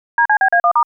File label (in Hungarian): Roman adas bekapcsolo Jingle; SZEKELYUDVARHELY; NAGYVARADI ATVALTO SZIGNAL